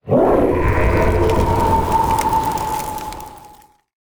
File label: breath attack small cold, dragons; creature; psfx